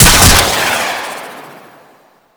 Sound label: Hand Rocket Launcher Shot 3D, Weapons; Warframe; Corpus